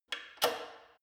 gym bicycle saddle adjust lock soft, fitnessstudio